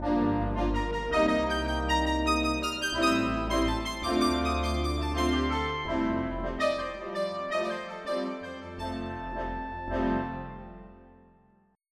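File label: synth brass; Roland - An Invitation To The Roland World Of Music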